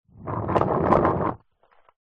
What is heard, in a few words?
sport skateboard plastic short skate close by